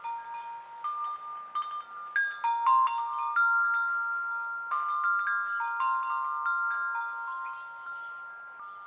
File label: wind chimes